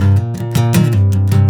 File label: Movin Acoustic, Guitars; Rock